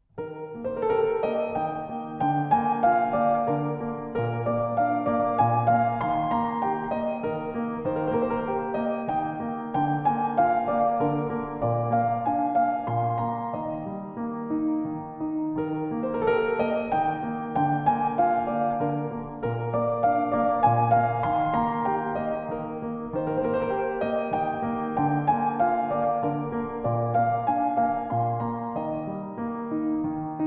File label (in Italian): Valzer pianoforte; von Capellmeister Konradin Kreutzer